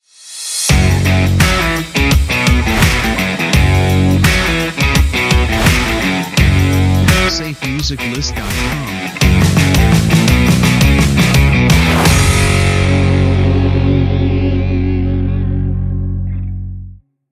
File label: Rock Guitar Intro